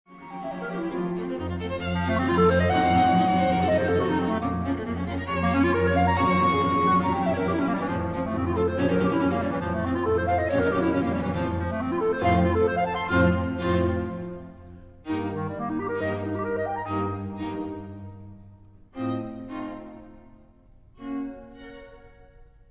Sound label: Fantasie Variations for clarinet string quartet